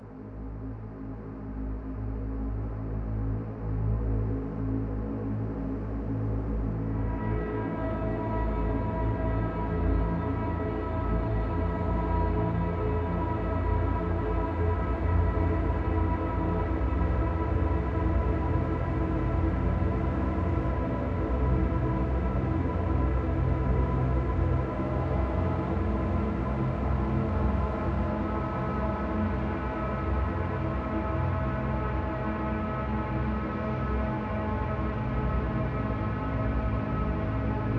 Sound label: dark ambient music loop; Hellpoint